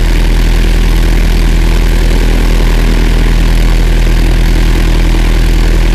Aircrafts Engines Jumo213A Prop; DCS

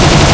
mg15 loop, guns; War Thunder

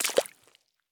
walk puddle; Escape from Tarkov

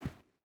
footstep rubber boots sand walk; Rust

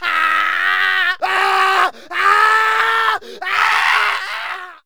beartrap scream; Rust

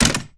lock code deploy; Rust

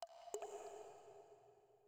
water drip; Rust

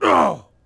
hit vox; Civ4 warlords

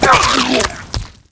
head pop

